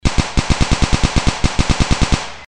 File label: Continuous Shot Of The Gun Ak 47